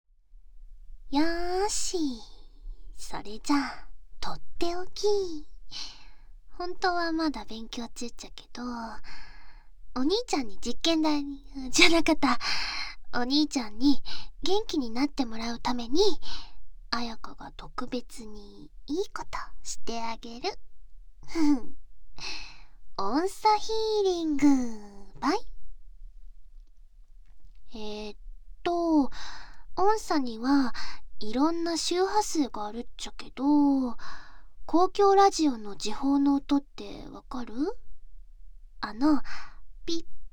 音叉 ヒーリング

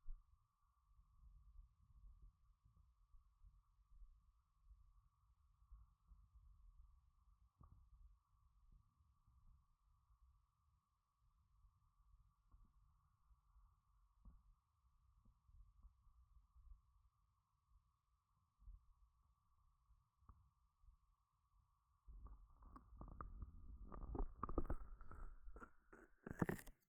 軽く耳塞ぎ; 3DIO; 耳かきに関する音

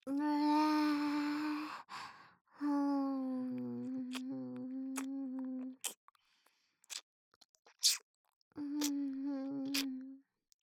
唾液たっぷり射精待ちフェラ編

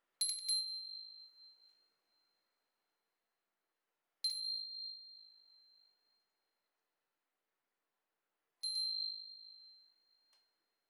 風鈴 の 音

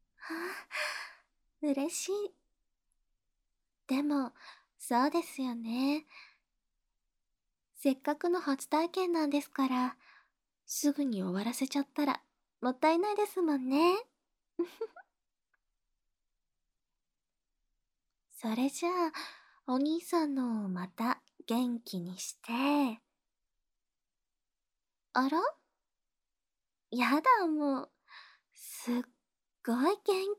フェラチオ

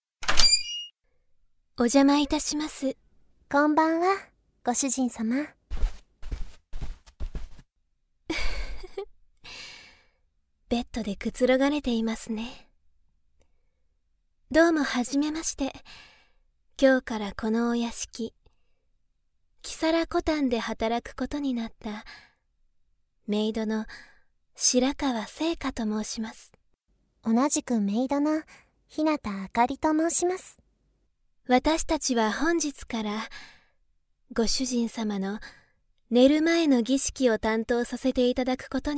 耳かき（右耳）, ASMR

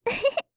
うふふ, 笑い声

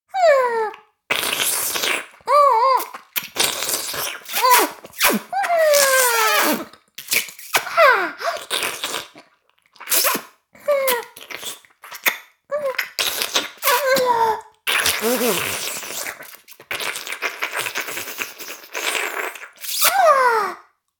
フェラ音声; おにいちゃんアイスぅー！; 妹

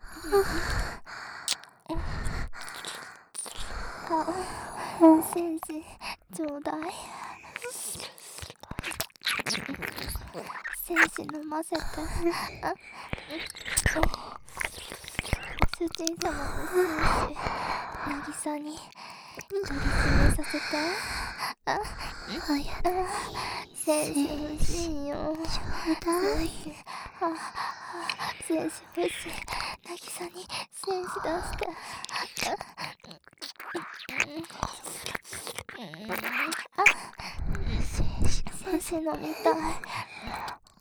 耳舐め 喘ぎ; 精子ちょうだい♪

